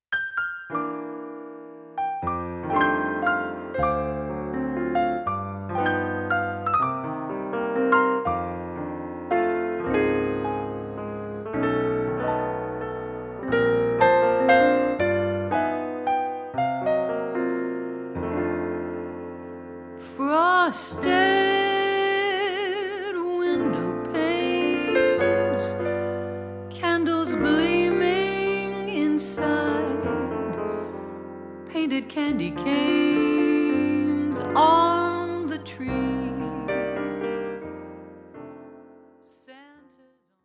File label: Christmas Waltz